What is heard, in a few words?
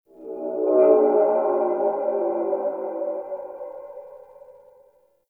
Exterior Space Whistles; Ambience; Warframe; Railjack